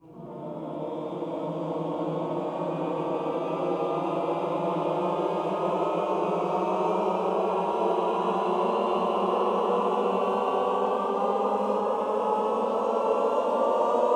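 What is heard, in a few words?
Cluster Glissando Up, Classical Choir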